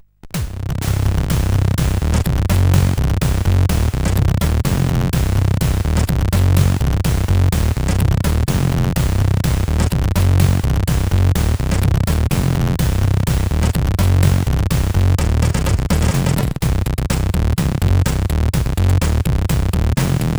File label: Joke 2, CSG8580